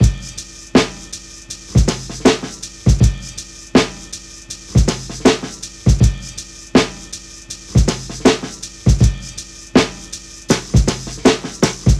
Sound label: Jazz; Head Full of Jazz